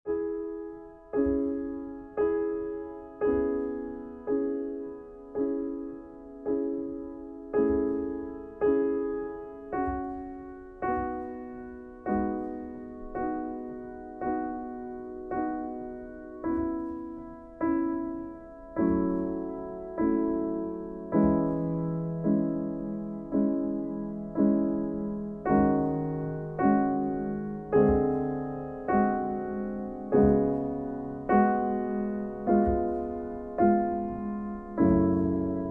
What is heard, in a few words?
Rachmaninoff Vocalise